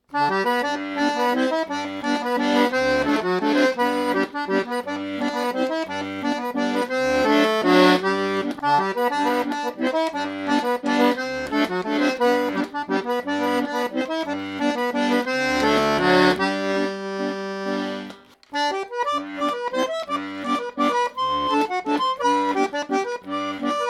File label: bandonika MEINEL GCF mazurka